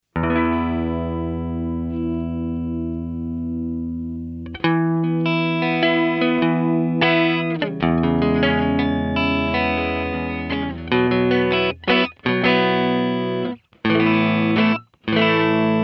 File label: Sapphire